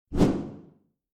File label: swoosh